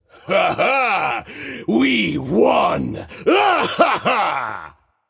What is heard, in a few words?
yay; smite; voicepack; ravana